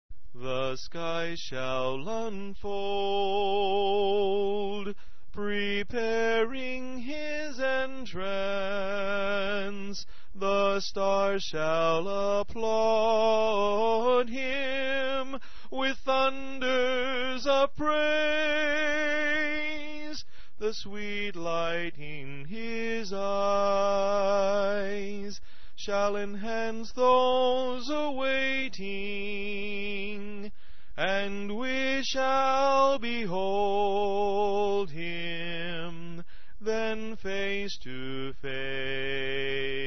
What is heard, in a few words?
Soprano